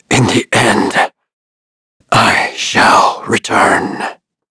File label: Vox Dead; Shakmeh; kingsraid